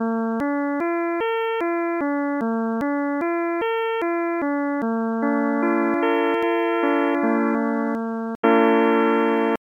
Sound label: triad sep chordvb ET; third